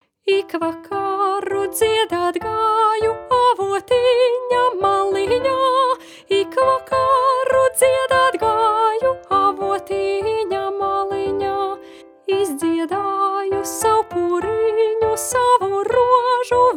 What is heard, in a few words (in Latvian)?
Soprans, vidusskolas jauktais koris; Ik Vakaru Dziedat Gaju; Apguves repertuārs vidusskolas jauktajiem koriem